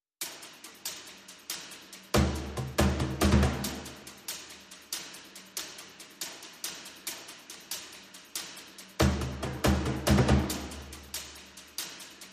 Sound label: Perc Only